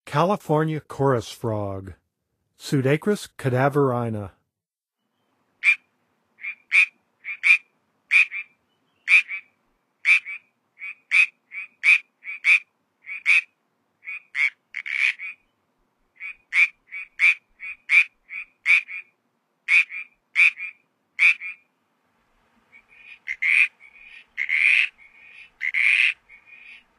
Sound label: California Chorus Frog; North America; Songs of Frogs & Toads of North America